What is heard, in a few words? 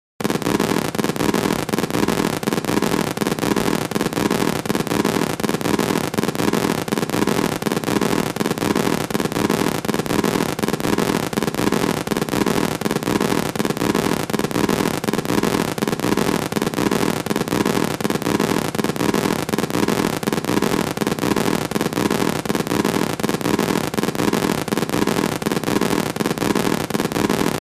Arc Small Constant; arcs & sparks